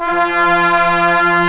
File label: Synthesizer Brass; konami